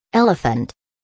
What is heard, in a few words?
elephant; wild animals